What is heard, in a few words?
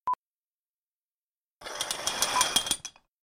Drawing Curtains